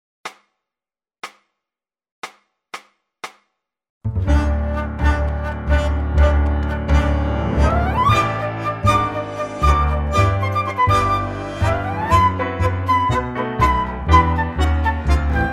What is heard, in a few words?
Danzarin play trio flute real